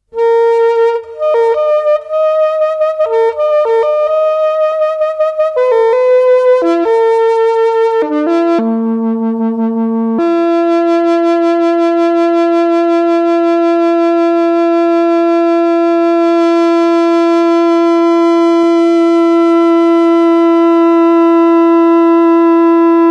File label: Saxophone to flute